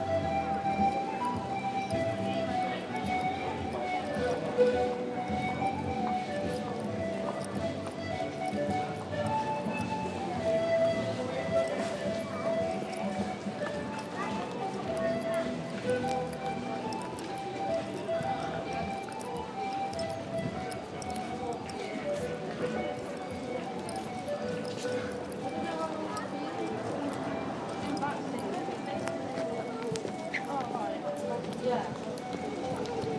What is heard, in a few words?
walking away from panpipe player bit of a jingly jewellery